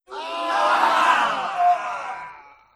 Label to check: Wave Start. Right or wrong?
Right